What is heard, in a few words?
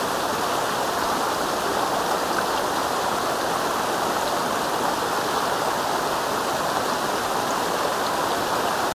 waterfall medium; The Sims 3; windows gamerip 2009